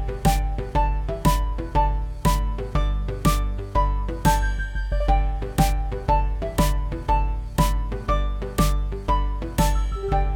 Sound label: Dance Loop; Game rip; MiSide